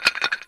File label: bump